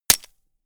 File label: gun shot silencer attached; schusswaffen